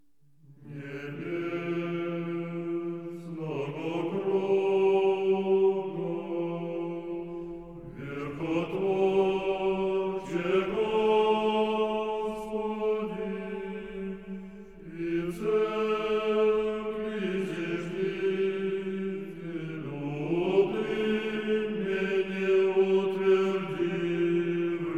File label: Orthodox Patriarchate Choir, Panikhida, Heirmos; Panikhida No. 13 Ode 3